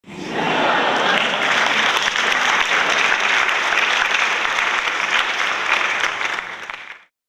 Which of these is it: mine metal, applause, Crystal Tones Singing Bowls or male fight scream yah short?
applause